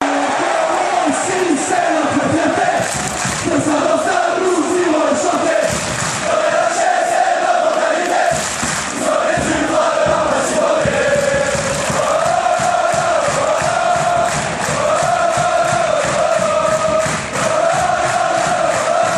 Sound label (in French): biancorossi c'est notre fierte, fan chants